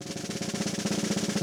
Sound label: roll, Drumscores, KITS MEDIUM; 90s sample CD